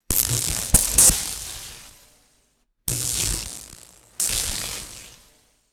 reverberant electrical static zap bursts; electricity

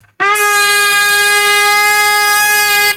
bright buzzing party horn blow; horns

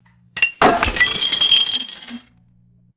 ceramic teapot smashing and scattering; destruction

